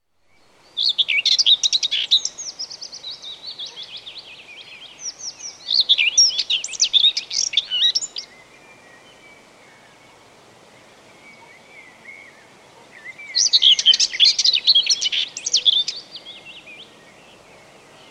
Whitethroat sining, with Willow Warbler in background; Bird; Birds; BBC